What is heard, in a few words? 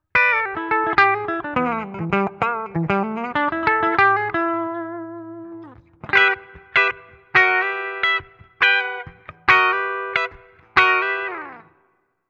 X700 Bridge Humbucker Clean Voice 2; Cort